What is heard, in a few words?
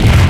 Space Station, SNES; Super Bomberman 5